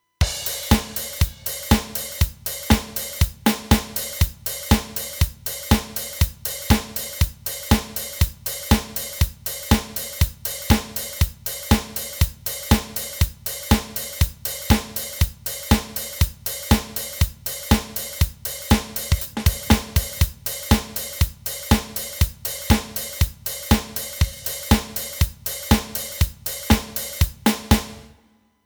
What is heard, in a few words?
Beat